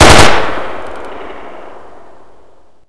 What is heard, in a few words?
g36e burst; firearms